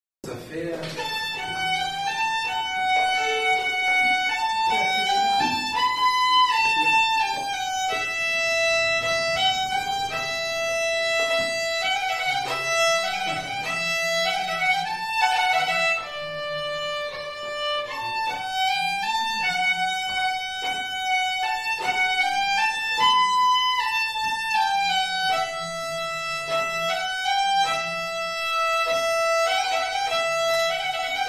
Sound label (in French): 1ere voix avec fioritures, violons